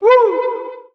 "woo", voice

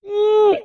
eeyah, Voice; ooh ahh

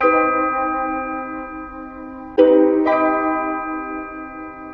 keys, Loops, Sad

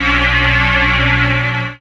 raspy, Synths

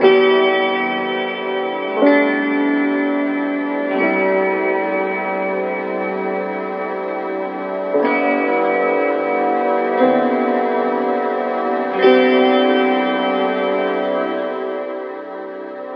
guitar chimes, Sad; Loops

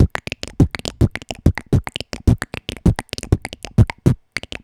wheat box, Beatbox, Drum loops

